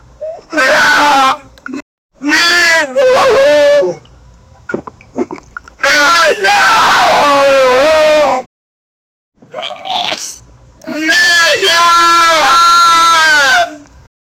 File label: the man screams and resis, dramatic